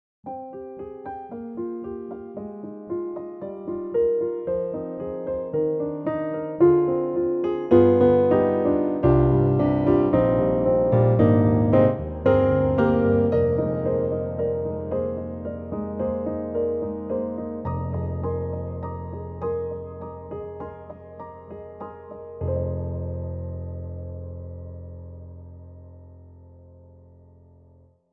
Piano Cinematic; Cinesamples; CinePiano

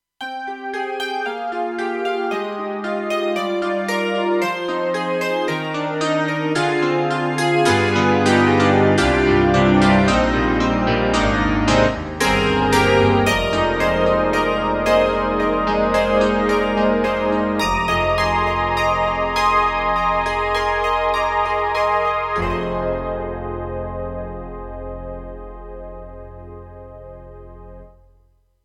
KORG TR-Rack Bell Piano Pad

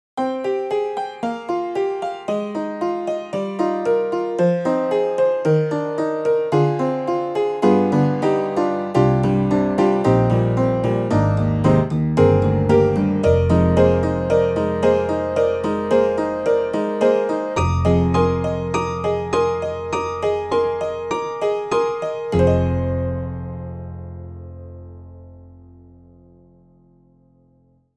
Honky Tonk Pno; IK Multimedia; Sonik Synth 2

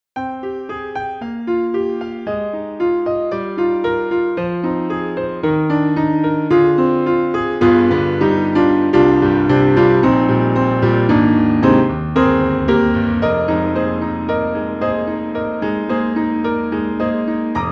BOSENDORFER 290; GRANDIOSO

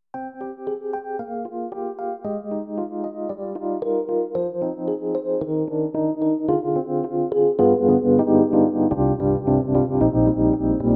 EDIROL SD-90 Tremo Rhodes

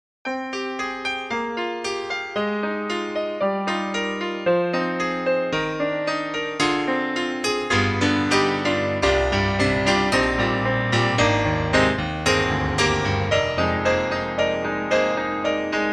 Pianos, Bechstein Classic Pop; EastWest; Quantum Leap

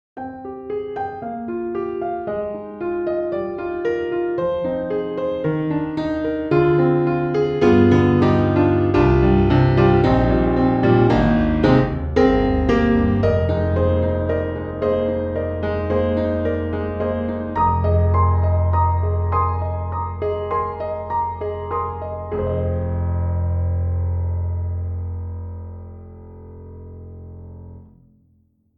Small Studio; Native Instruments

